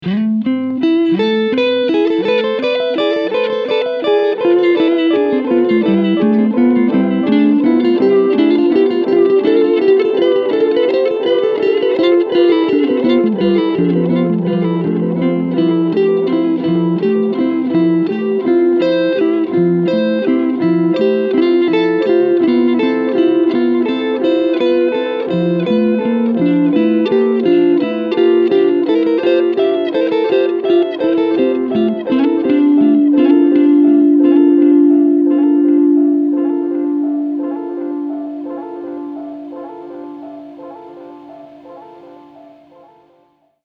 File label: Long Delay